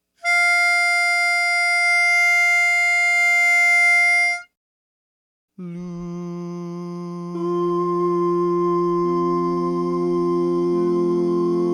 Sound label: Tenor